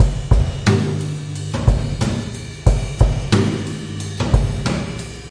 091 melodic deep kicking ride, drum loops and breaks; 091 to 100 bpm